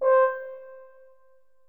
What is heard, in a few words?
french horn